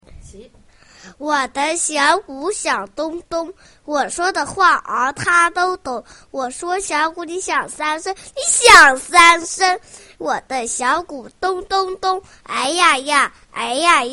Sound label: Drum